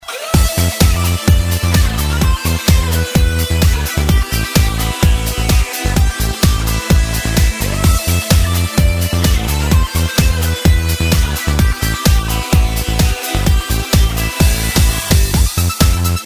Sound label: tom snare running